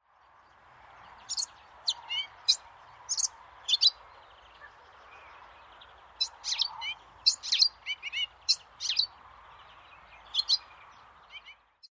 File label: melodious warbler